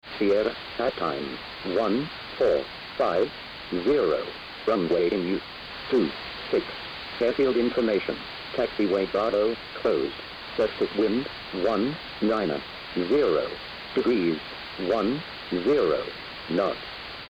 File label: AirBand Original